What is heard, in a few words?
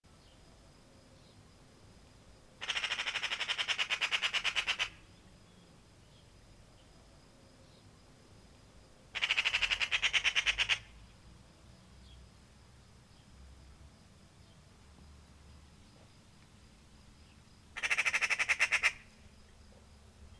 Magpie